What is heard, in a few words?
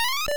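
player pickup item; 8 eyes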